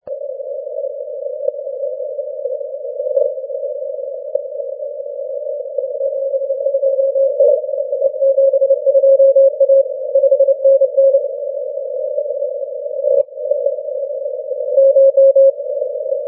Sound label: 80m cw